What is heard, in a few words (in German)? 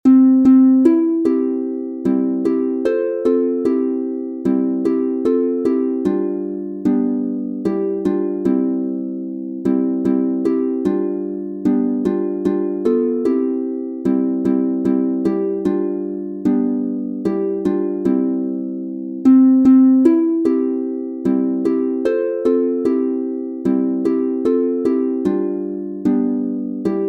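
C Dur 3 stimmig, Harfe; Am Weihnachtsbaum die Lichter brennen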